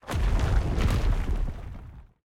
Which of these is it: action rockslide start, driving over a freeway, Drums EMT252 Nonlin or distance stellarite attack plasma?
action rockslide start